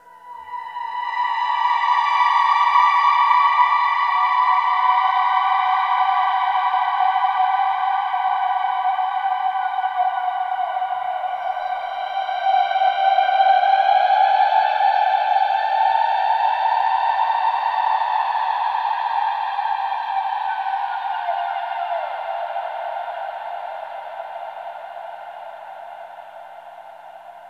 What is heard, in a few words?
SIRENS IN THE WATER, SLOW SYNTHESIZER WAVES